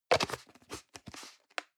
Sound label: plastic can small lid screw close hard